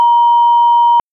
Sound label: beep; fgdata